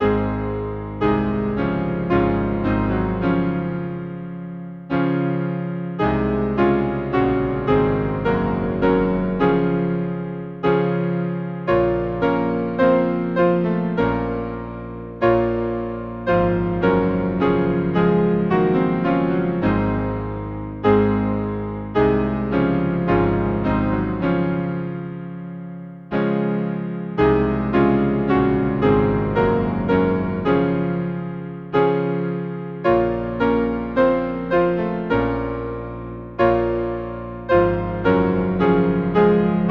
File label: piano, Hymn; My God accept my heart this day; Song 67